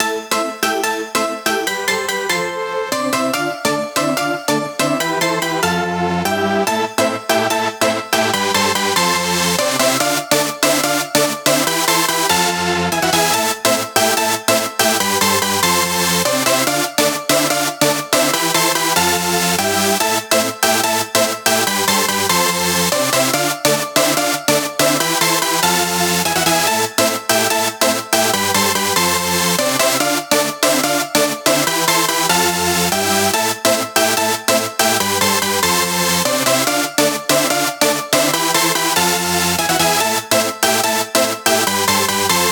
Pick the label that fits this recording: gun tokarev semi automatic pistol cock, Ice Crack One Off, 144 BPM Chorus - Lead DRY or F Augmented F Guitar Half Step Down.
144 BPM Chorus - Lead DRY